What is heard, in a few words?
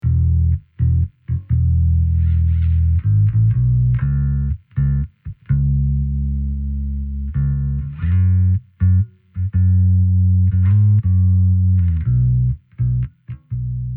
Jazz Bass